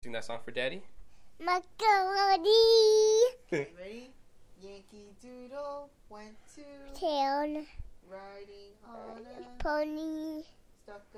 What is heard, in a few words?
sings along to Yankee Doodle